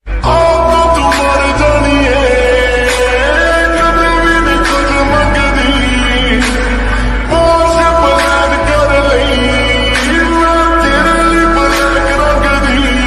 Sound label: Song Lofi Version Ringtone